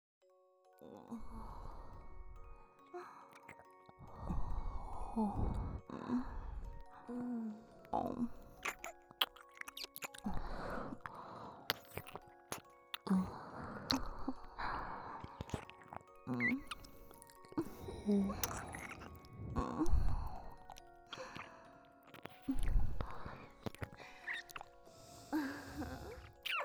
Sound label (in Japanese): 耳舐め&キスしながらのフェラチオ; 【スーパー甘園房タイム】ゆかりママを呼んで; BGM有り